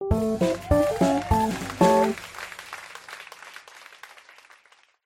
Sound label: win